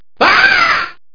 schrei

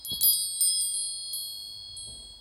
blume

